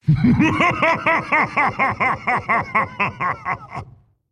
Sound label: attack laugh; lord jaraxxus